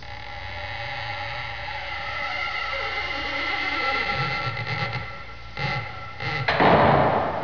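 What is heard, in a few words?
Door, Spooky Sounds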